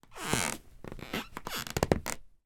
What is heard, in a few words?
foley footsteps creaky attic floor